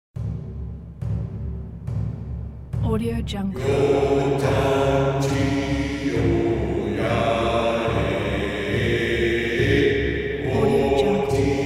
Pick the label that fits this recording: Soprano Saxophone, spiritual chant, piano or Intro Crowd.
spiritual chant